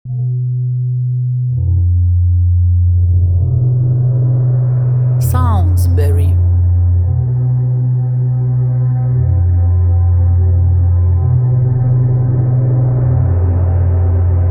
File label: Horrortrip